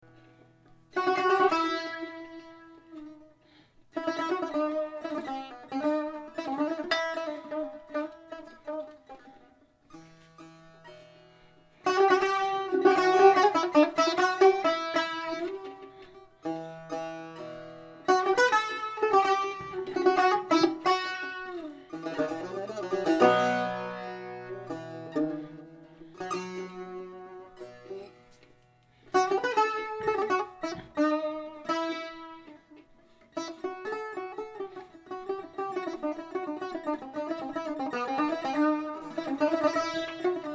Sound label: persian tar audio sample